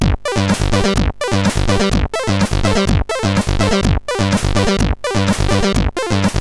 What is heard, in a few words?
Sleepy, CSG8580R5